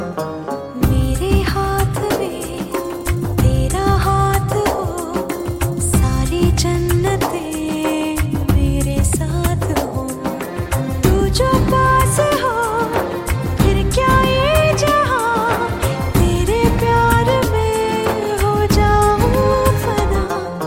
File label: Romantic Bollywood Female Ringtone